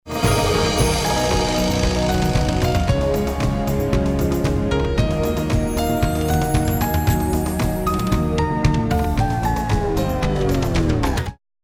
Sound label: sample fx modulator; cubase